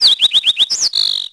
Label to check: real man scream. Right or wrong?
Wrong